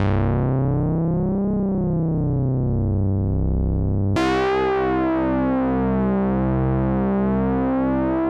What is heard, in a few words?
Sync Bass; Vortexual amplitude